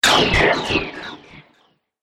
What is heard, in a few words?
Science Fiction Laser Heavy Plasma Blast, laser gun; Sci-Fi Laser